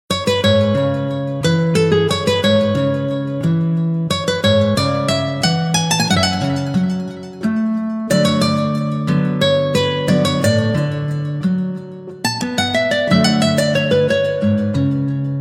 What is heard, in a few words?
Guitar